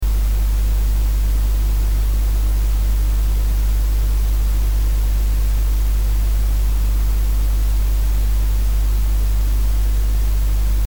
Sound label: Noise